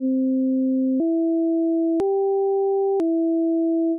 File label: Title Bgm; 2d platformer